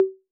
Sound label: Conga Hi A 808; Clean; Digital